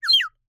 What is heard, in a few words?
Bird whistle, clay, painted, water, airy, wispy, burst, very strong, shriek, solo chirp; Eiravaein Works; Vaeyan IV